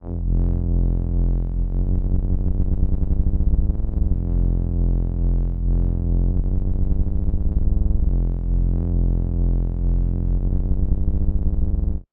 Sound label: Moog F 120bpm; Before The End